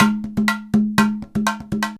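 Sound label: emelabo grooves; Best Service; World Colours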